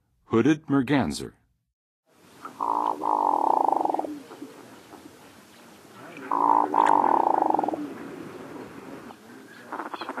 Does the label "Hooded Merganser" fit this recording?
Yes